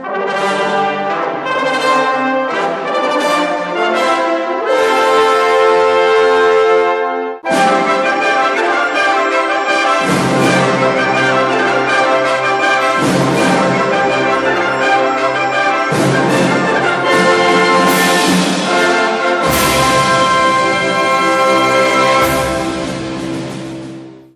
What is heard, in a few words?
fanfare and theme, sonnerie; olympic